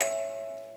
musicbox

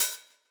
hat

